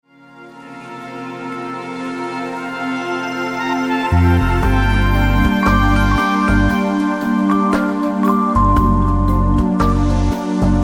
Swinging Soul

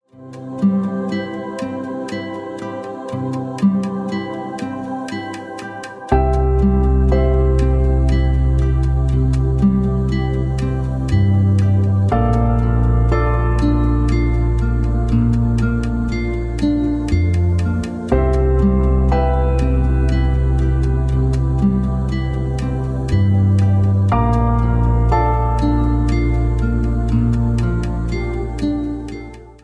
Morning Breath

